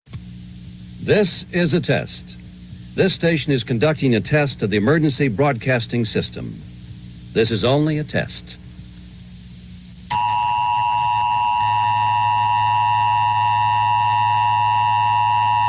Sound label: emergency